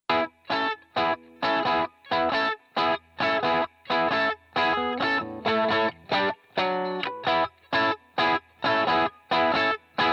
AB Vergleich Gitarre

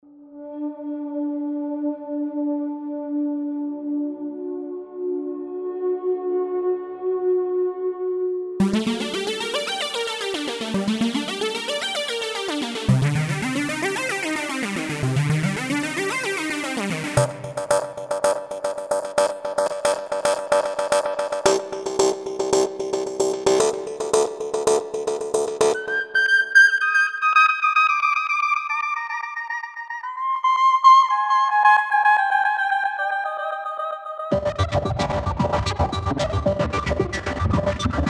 Einzelsounds Melody

